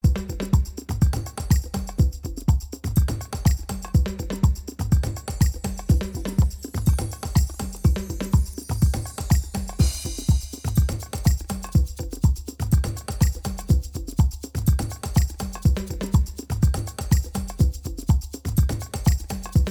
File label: Drum Example